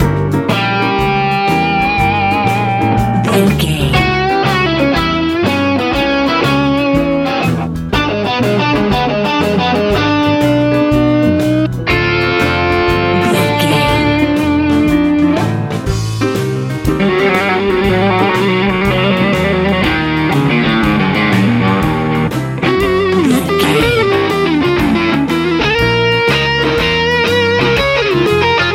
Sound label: blues